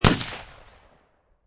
Rifle Winchester 30-30 Single Shot Exterior At 45'; at 45 feet